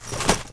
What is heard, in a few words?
Webbing; Player; Footsteps